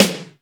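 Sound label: GATE snare, drum; Crypton - DrumBase5000; GATE KITS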